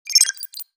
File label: tripmine explo beeps; weapons; Half Life Alyx